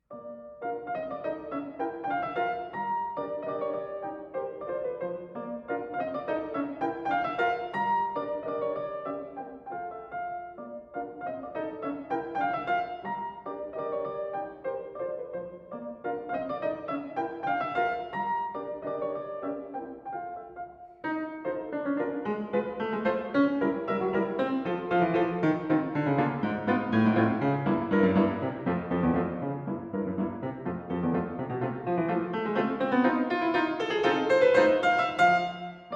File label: Polka, Piano; Tchaikovsky- The Complete Solo Piano Works